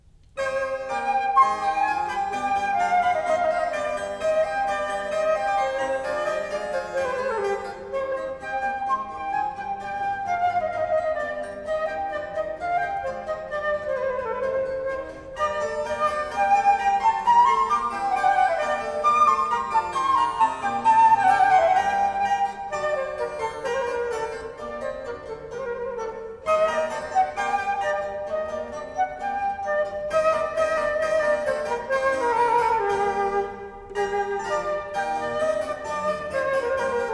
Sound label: Sonate C Allegro (tempo di gavotta); Vivaldi